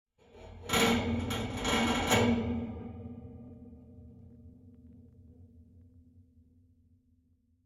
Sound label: metal shelf creaking; sonniss gdc